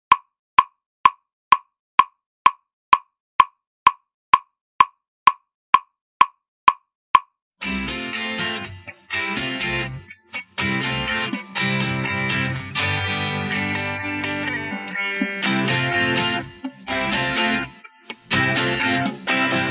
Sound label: Drumless